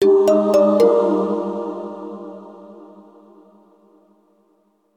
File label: sound logo